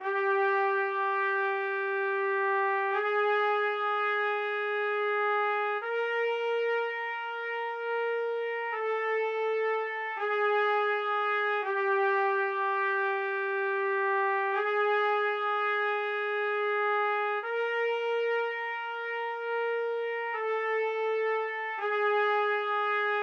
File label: trumpets